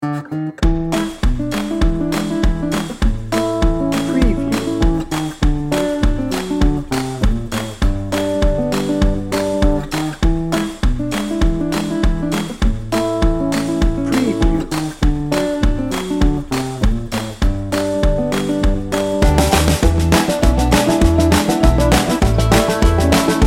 country folk two step 60 second

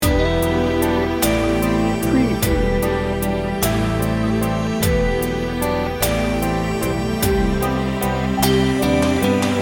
breathing in loop

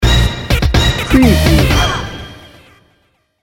bollywood beat blaster sting bumper

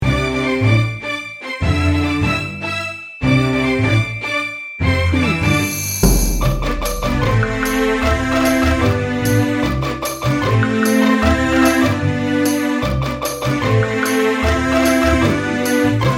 game show no drums

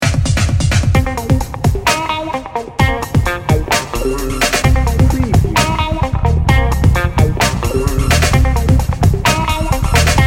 funk no horns

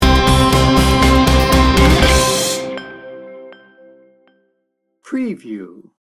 atmospheric and dreamy sting bumper

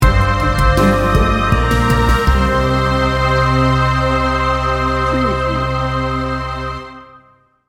heartfelt sting bumper

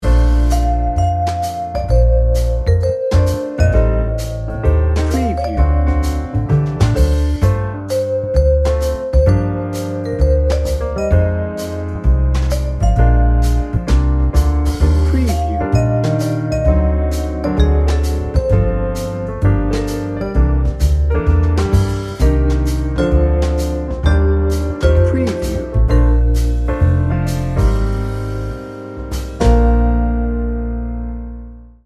mellow jazz

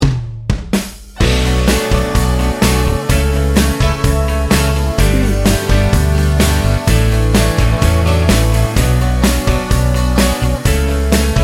country friendly

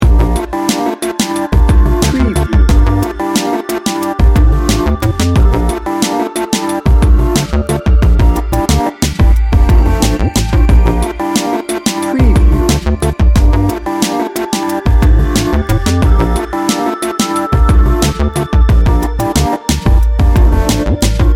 sultry sexy hip hop loop

